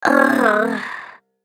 dying; BadRobotAmumu

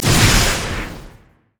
guardian spell attack; odin

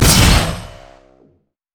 heat seeking missile; LoL; urgot

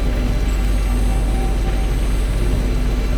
flame breath; LoL; shyvana

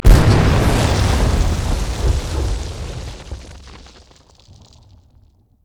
turret dying; LoL

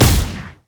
heat seeking; LoL; battlecasturgot

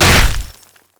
basic attacks; Vi

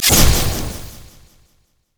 critattack; LoL; yasuo skin01

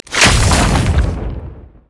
spider attack; LoL

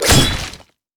darius basic attack

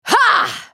ultimate effort; Fiora

